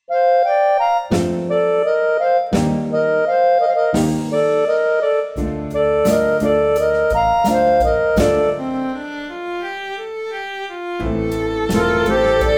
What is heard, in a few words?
F major violin